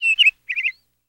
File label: Canary Call, Chirp